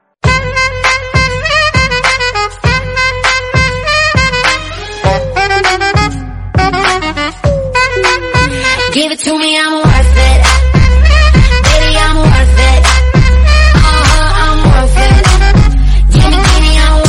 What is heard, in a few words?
loud background music tones ringtone